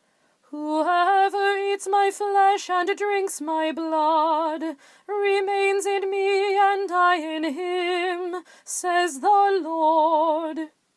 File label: Communion; Corpus Christi